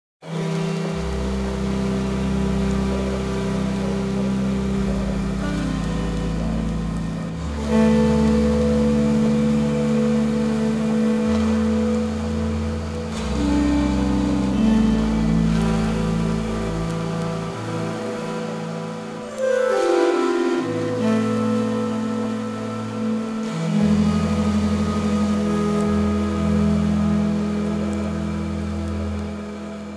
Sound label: D Minor 128 bpm; MIDI Clips; Tonal; Movemental; Ableton; Factory Packs; Lost and Found